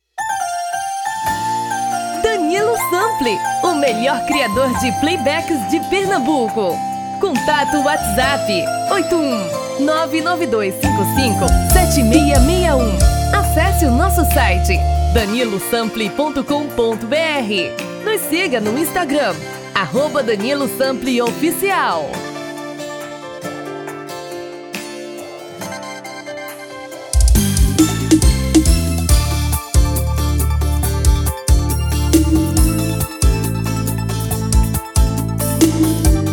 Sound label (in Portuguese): Brega